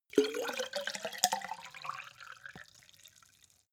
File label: carafe refill